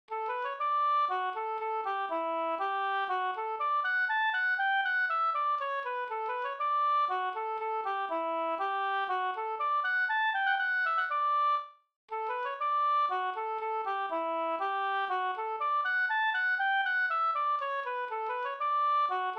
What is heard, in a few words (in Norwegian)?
pols springdans; fr Ostfold u Nilsen Halden no